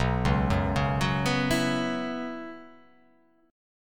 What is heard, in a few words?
B Suspended 2nd Suspended 4th down; arpeggiated; JGuitar